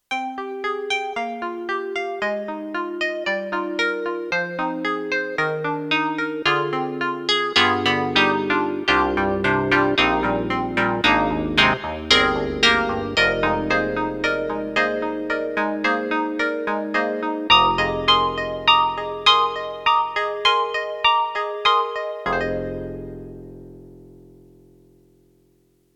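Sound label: YAMAHA MU1000EX, Sweep Clavi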